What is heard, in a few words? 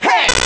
Glitched; LG U8100